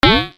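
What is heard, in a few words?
percussion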